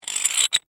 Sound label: Clamp Slide Close, Industry, Clamp, Slide, Close, Metal; Factory Sound Effects; Factories